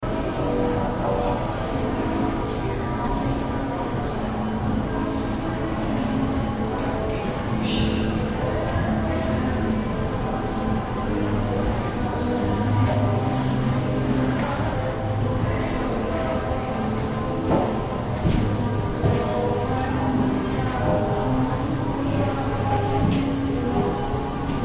atmo arizona prescott small lobby

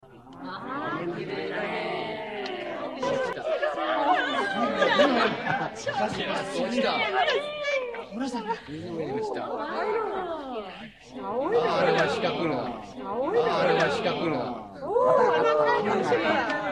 asia small crowd

